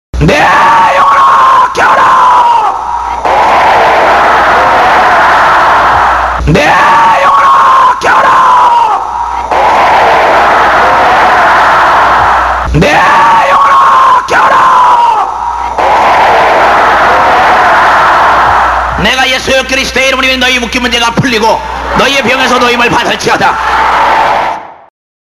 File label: wakeup